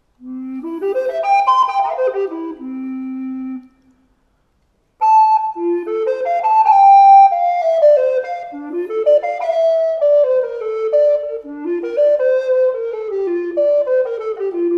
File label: Dolmetsch tenor recorders; 1924